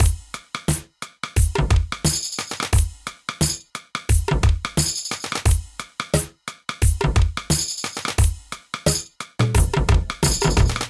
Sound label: Acoustified Kit; Ableton; Bomblastic